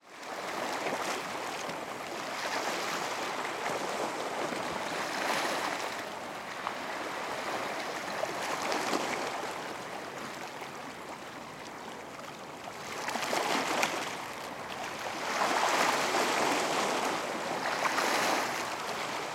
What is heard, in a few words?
water ocean waves break on sea wall